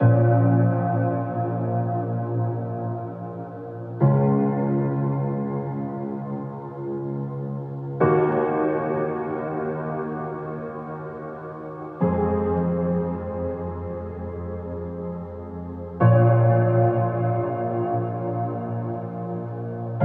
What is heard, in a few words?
Piano - Bbminor 60BPM; STEMS; Ghosthack; SkyRealm; Mood Kits; Mood Kit 07 - All Is Not Lost